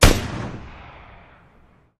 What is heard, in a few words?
Gun Sound; Gangstar; Gully Loops